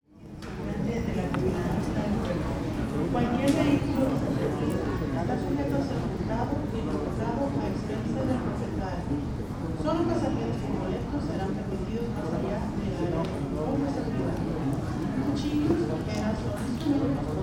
Airport ambience walla terminal beeping boarding intercom coughing eating talking; dallas; kids; Matt Script; Airplanes & Airports